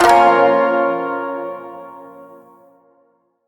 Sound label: Shawzin Hirajoshi Chord C; Warframe